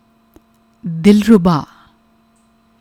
dilruba, musical instruments

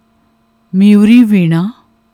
mayuri veena

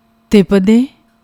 tipade; Bani

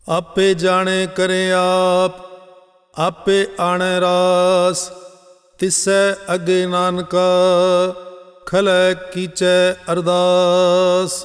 salok; ardas

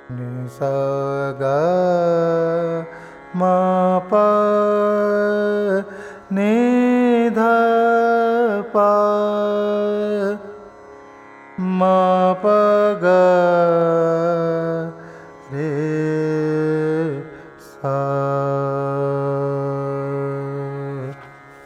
mukh ang, dhanasri

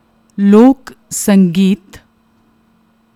Lok sangeet, Regional folk music; Indian music

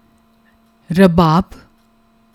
rabab

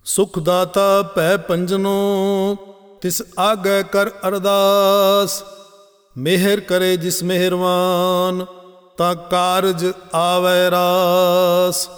salok; ardas

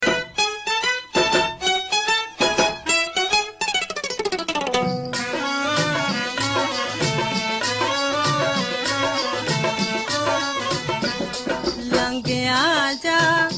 punjabi folk song; Sikh religion; Shabad Keertan

